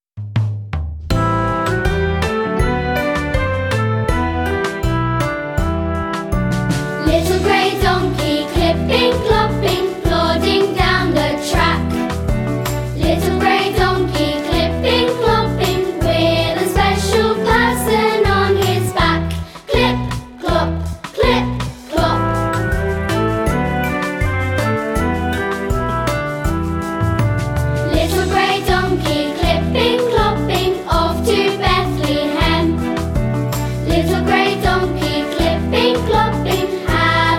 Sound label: Vocal